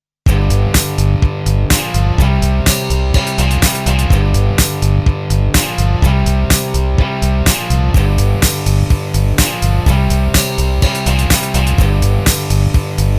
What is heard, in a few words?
Jingle